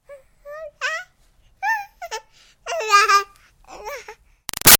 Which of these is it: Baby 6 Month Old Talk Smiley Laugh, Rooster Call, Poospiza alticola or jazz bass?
Baby 6 Month Old Talk Smiley Laugh